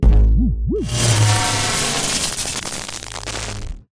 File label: Static fur